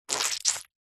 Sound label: cartoon squelch squish mouth saliva